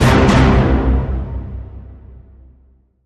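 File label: Hit Orchestra Tutti Double C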